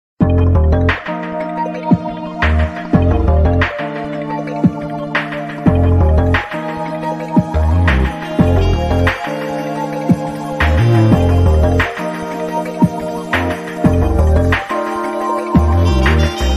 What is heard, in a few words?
Underground; SMM; SMW